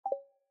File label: alert error; Google; Material Design sounds; Secondary System Sounds